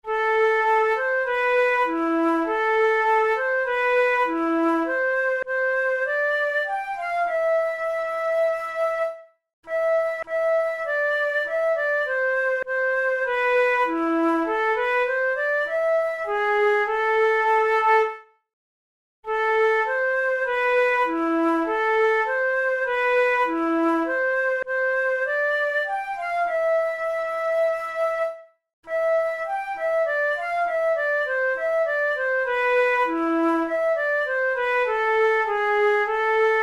lullaby, flute tunes

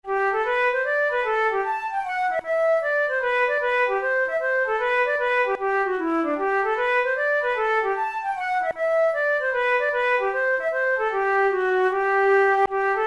hornpipe, flute

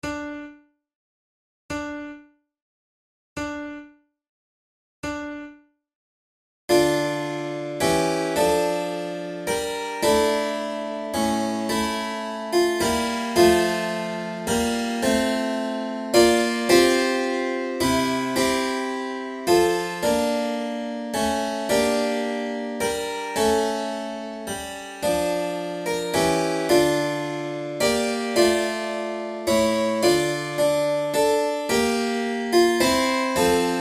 recorder sonata in f major siciliana, flute

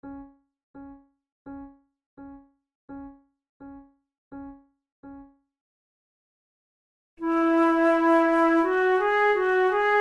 methode de flute; tulou

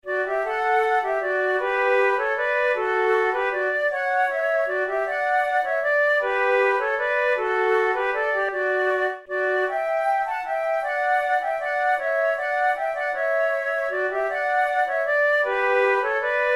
duet; my ain fireside

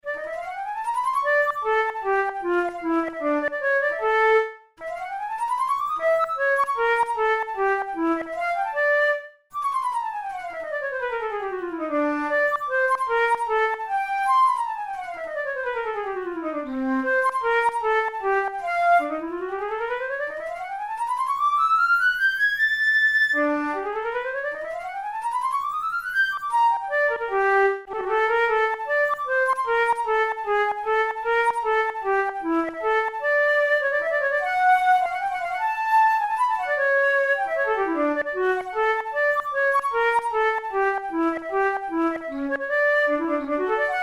studies for the boehm flute; drouet 72

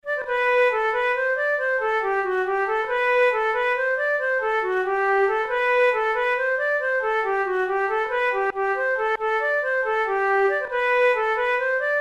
jig, flute

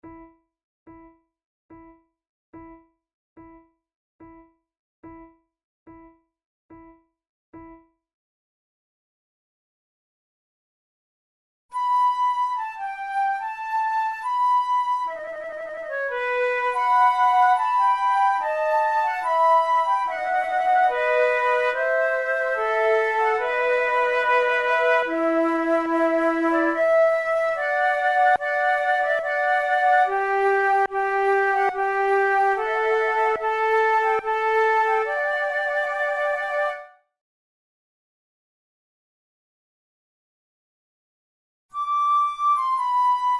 sonate en trio, tendrement, flute

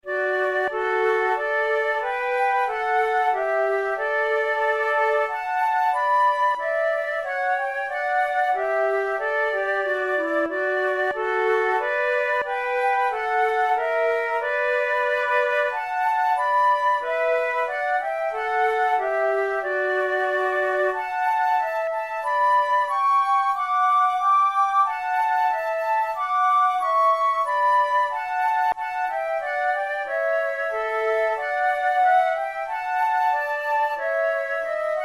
duet

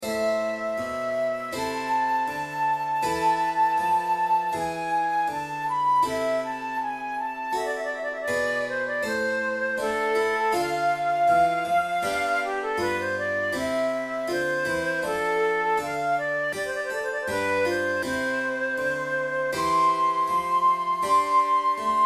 flute sonata adagio; bach

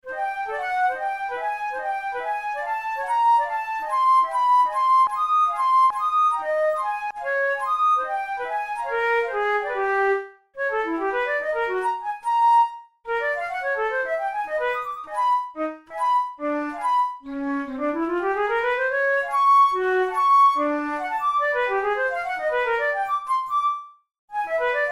studies for flute solo; karg-elert

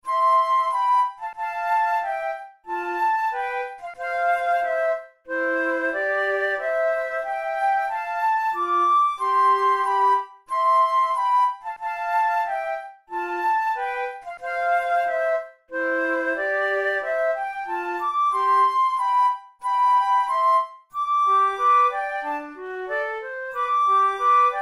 methode de flute; tulou

